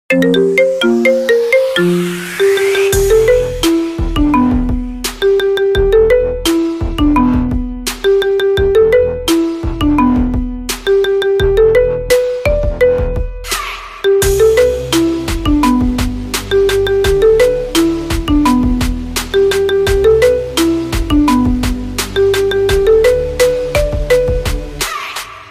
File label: Marimba